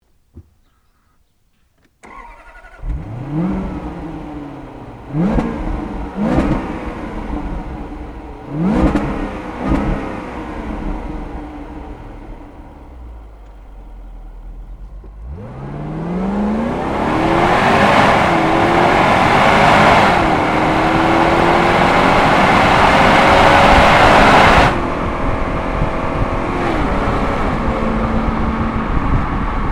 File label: Range Rover SC